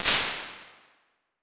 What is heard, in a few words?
1.6s Narrow Staircase, Impulse Responses; Medium Spaces; Indoor Spaces; Apple